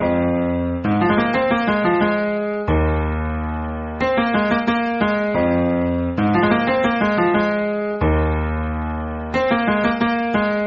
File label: sample melody